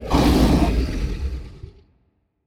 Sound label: kaiju grow up; biogenesis